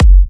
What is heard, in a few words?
F#, Kicks; markus hakala